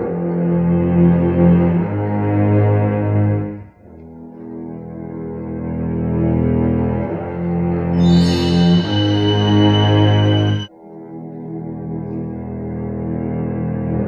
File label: strings dark, Sad; Loops